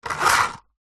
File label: leisure toy lego car push wood lift wheels spin air